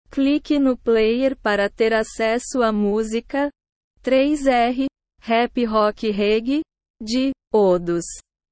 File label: rap rock reggae